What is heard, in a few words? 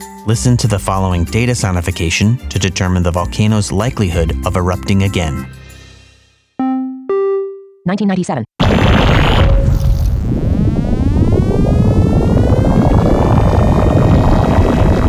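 Sonification with Pings; Axial LT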